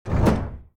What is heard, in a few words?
Door Heavy Open; The Binding of Isaac